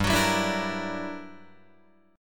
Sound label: G Minor Major 13th, G, Guitar, DADGAD